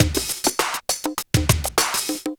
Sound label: Club Tip, R&B Drums